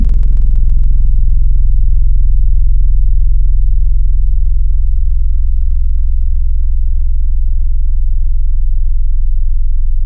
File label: Lofi Rhodes; Synthetic's Serum Collection; Surge Sounds - ASTRO - Serum Wavetables